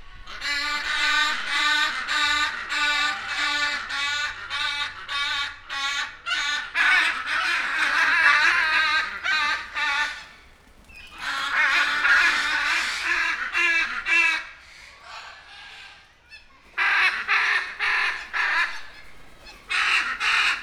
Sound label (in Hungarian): nem mesterdallam, jácintkék ara; nyiregyhazi zoo 2019